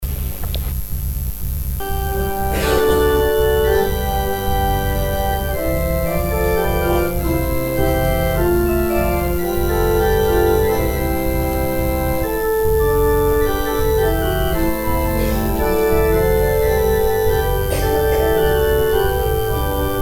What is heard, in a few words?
organ interlude; Offering